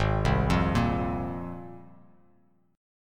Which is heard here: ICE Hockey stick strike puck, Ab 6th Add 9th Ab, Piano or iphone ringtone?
Ab 6th Add 9th Ab